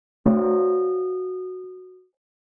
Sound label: Metal Pan Impact Hi, gong